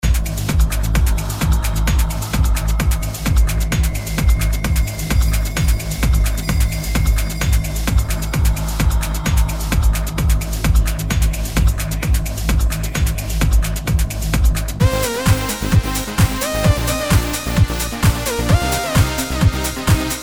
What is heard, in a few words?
Trance